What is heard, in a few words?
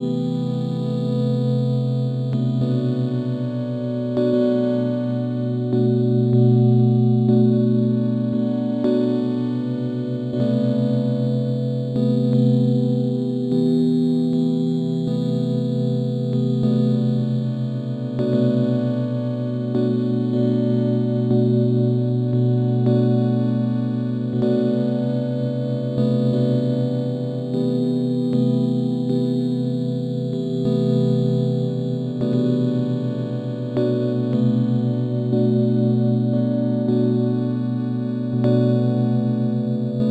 Em120 LIMINAL Noises